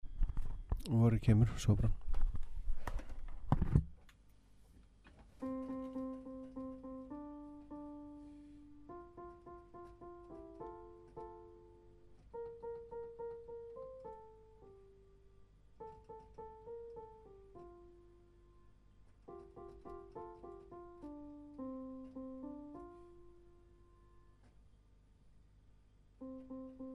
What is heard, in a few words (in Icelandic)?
sópran